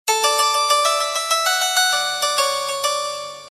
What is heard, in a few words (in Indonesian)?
nada dering samsung Galaxy Note SMS